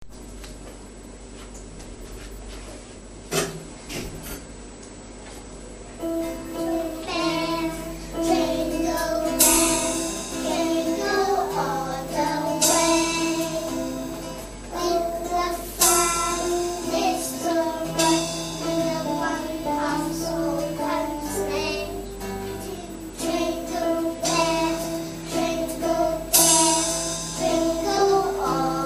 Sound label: jingle bell